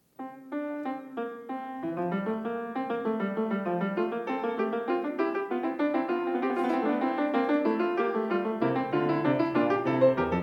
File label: Fugue No.11 in F major; J.S. Bach; The Well-Tempered Clavier, Book I BWV 846-869; BWV 856